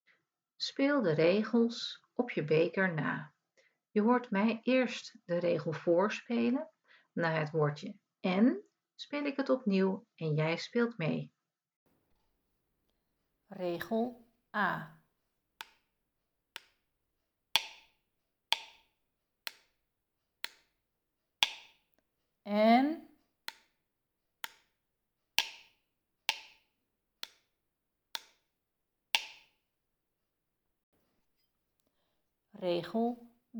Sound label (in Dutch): De trommel beker echospelen